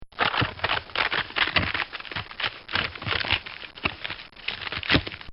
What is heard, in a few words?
Cartoon Sound Effects Crunchies; Cartoon Sound Design